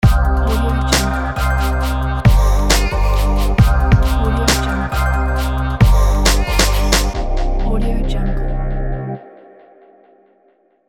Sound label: urban hiphop logo; eatalot